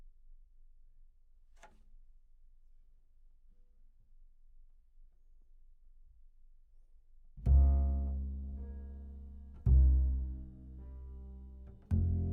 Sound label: Bass